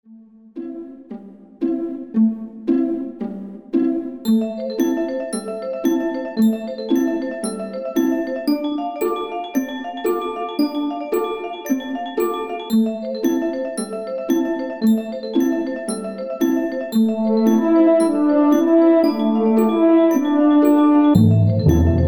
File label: jingle